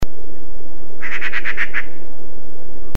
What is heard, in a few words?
pica pica, winter birds